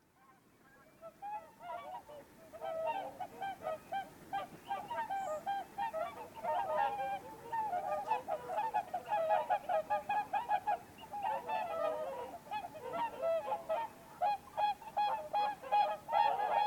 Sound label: cygnus cygnus, Labuť zpěvná